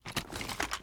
mg3 raise up; tacz; daffas arsenal

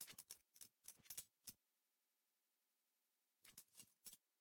inspect, hk416; tacz; daffas arsenal

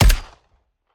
hk416 sup last; tacz; daffas arsenal

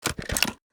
awawawawawa; spasi15; tacz; daffas arsenal

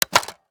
mag out; spasi15; tacz; daffas arsenal

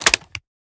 sg550 mag ins; tacz; daffas arsenal

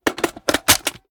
mg3 mode on; tacz; daffas arsenal

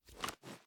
hk416 mag grab; tacz; daffas arsenal

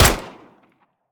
last, hk45; tacz; daffas arsenal

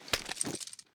inspect; p99; tacz; daffas arsenal

